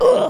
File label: oof, speech, Pirate